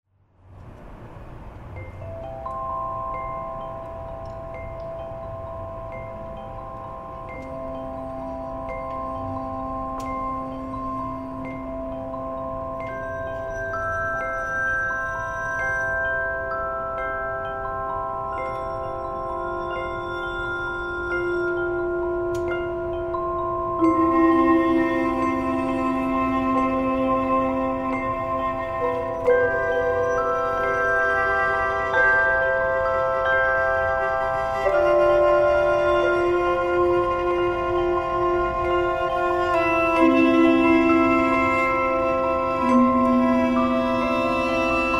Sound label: Acoustic Ambience